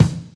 rich mid-range bass drum sample e key, kickdrum sound tuned to the E note